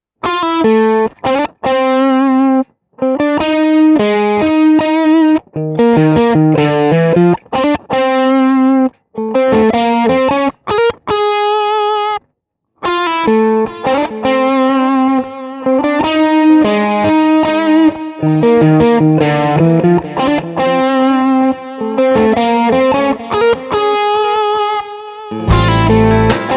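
lead crunch, Guitar Dry Sound – Guitar Wet Sound – Guitar + Band; USA ’80 LEAD Crunch (Mic Pre Amp Neve); From 0″ Guitar Dry Sound – From 14″ Guitar Wet Sound – From 26″ Guitar + Band; Kemper Profile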